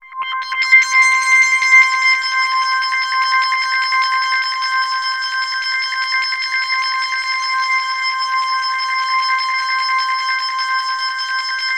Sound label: C5, Korg MS-2000; Synthworx